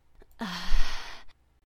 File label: Breath; Lewd SFX